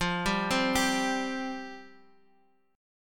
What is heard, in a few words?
G 7th Suspended 4th F